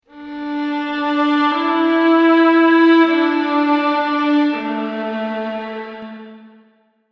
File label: reverb